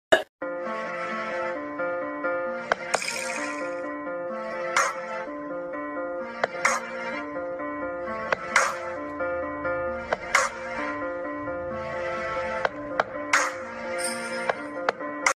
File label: Beat